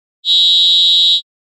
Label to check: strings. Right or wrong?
Wrong